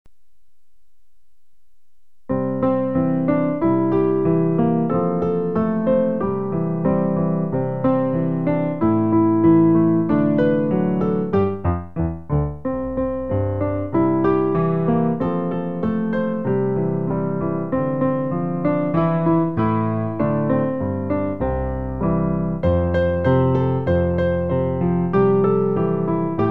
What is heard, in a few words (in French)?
piano; recueil Louez Dieu